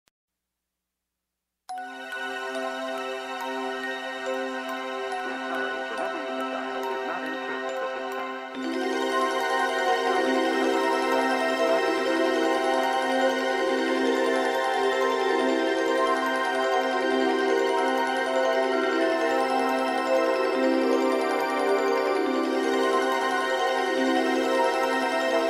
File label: bgm ensemble